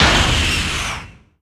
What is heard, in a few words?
missile fire; weapons; Stellaris